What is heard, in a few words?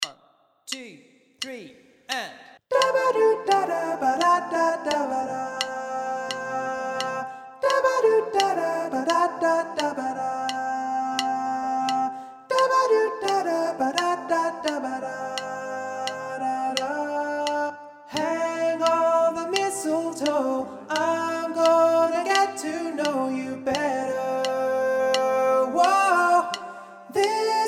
sop recording at home